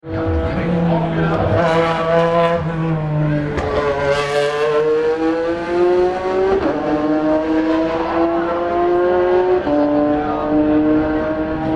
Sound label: porsche 911 after ford chicane